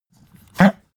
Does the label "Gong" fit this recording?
No